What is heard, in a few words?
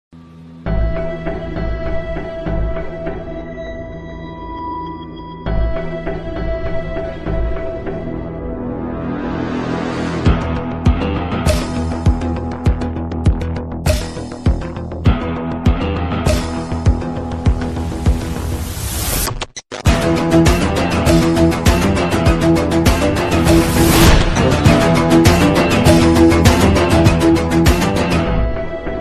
free rock background music tone